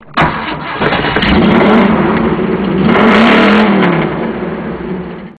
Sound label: truck, cars